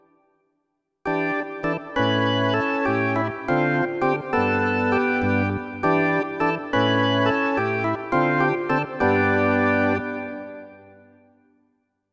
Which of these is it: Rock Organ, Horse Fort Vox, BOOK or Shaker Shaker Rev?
Rock Organ